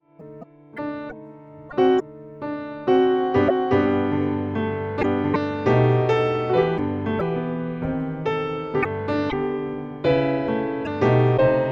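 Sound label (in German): Choräle am Piano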